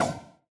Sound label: ball bounce cold; CrossCode; battle